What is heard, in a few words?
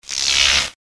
current arcing